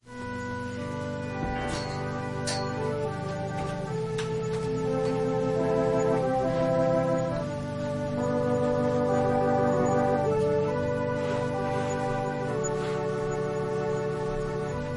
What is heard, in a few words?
Organ prelude